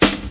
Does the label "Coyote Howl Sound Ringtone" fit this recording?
No